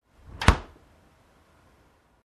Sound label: Cabinet closing